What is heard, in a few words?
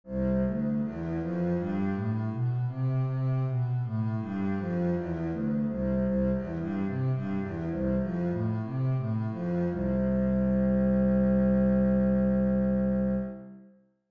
bass c major, scales